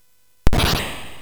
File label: Space Gun